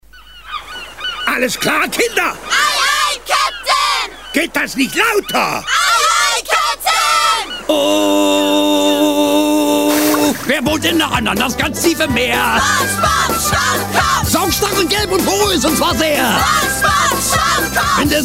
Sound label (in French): generique allemand; bob leponge